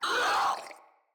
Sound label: Infested Crawler Pain; Crawlers